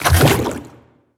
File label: jump; blastocyst